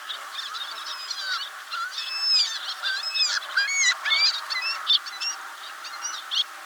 Goéland d'Audouin (Ichthyaetus audouinii) - Chant plaintif

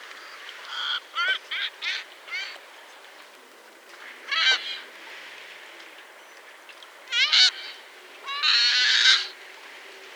Goéland d'Audouin (Ichthyaetus audouinii) Cris éraillés

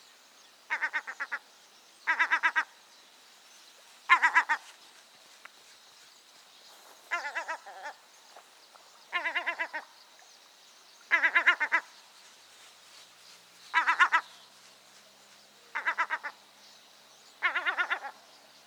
Goéland leucophée (Larus michahellis) - Alarmes gutturales

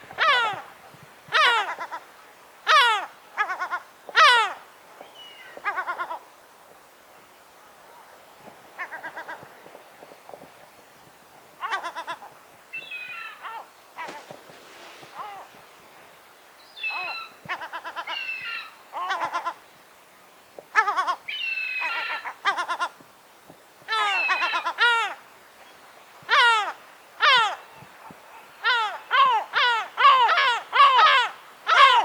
Goéland leucophée (Larus michahellis) - Cris miaulés et alarmes gutturales

